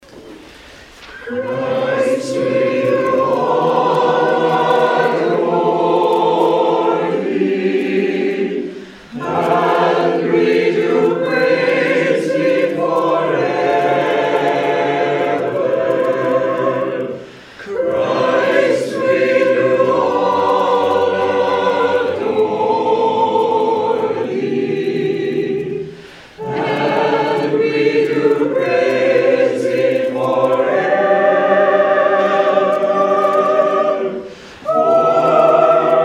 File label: Hymn; Christ, We Do All Adore Thee